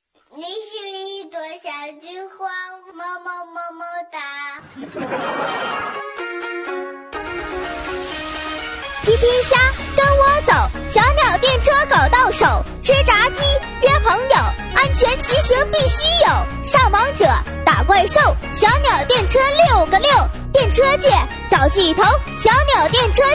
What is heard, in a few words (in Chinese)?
喊麦; 喊麦改编